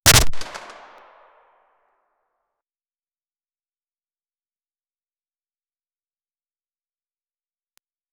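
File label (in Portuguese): efeito sonoro lançando rif, shooter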